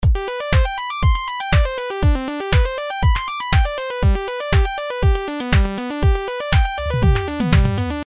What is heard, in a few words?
arp play